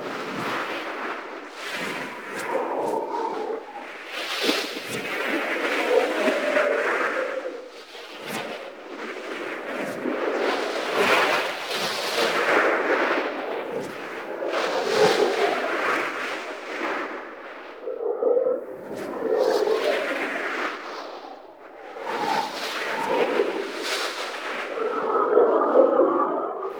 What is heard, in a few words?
Nimble Movement Wind Loop, Ship; Alternate Engines